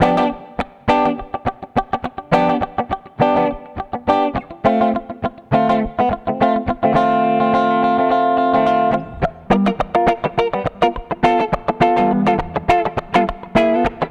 Kompressor Vergleich SSL Native Comp V6

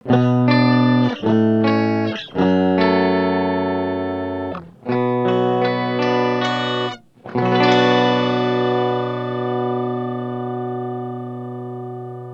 Mooer Harmony X2 obere Oktave

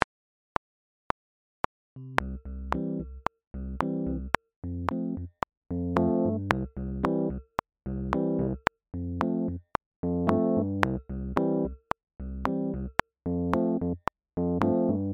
Reggae Begleitung binaer

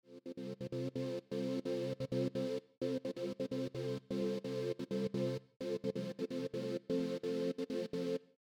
Ghostdrums oeffnen Gate fuer Pad ohne Drums; Sidechain

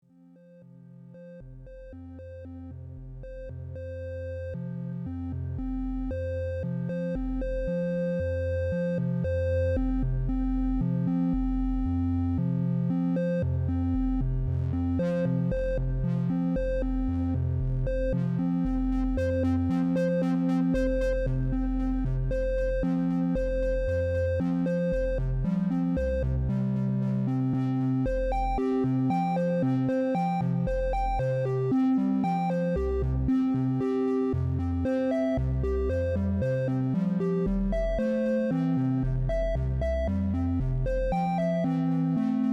DOEPFER A 149 4 2 VCO mit Quintenabstand

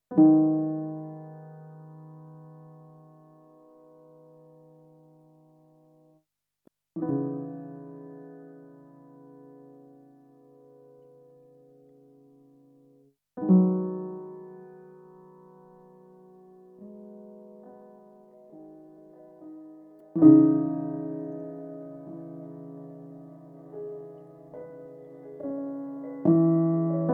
Korg Kaoss Replay Resampling Copy Funktion

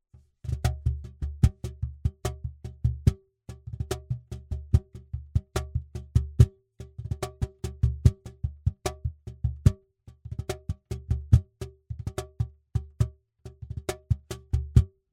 BL8 Omni Cajon Bearbeitet

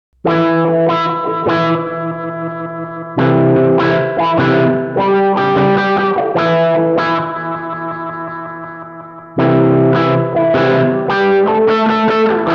Ghost Pedal Autowah und Ducking Delay u Reverb; Endorphines

